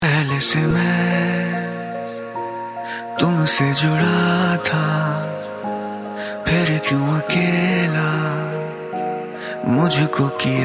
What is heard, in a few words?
Sad Version; Animal